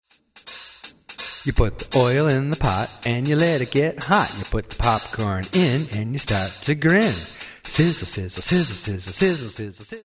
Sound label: Popcorn Chant